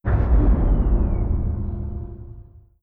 distance disruptor fire; weapons; Stellaris

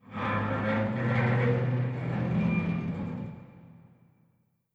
abandoned ship stinger, ambient; Stellaris

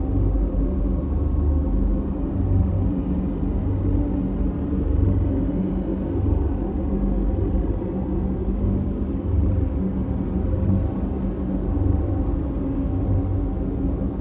construction ship hum; Stellaris

